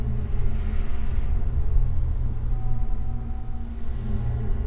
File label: Large Fan Room; Lethal Company